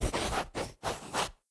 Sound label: Write; blue archive